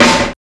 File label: SNR XC SNR; Club-50 - Foundations Roland; Cheez Snrs